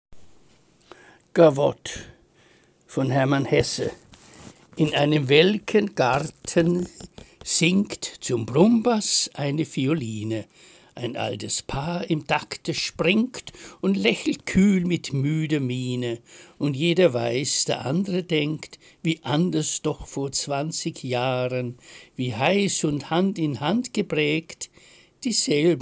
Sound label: Gavotte; Hesse